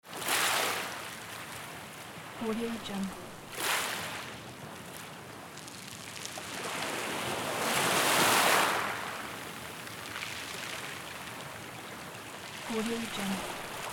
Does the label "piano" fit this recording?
No